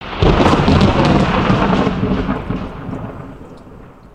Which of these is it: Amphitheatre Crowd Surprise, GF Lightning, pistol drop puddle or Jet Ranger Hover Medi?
GF Lightning